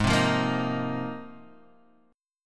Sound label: Ab 6th Add 9th, chord; strummed; JGuitar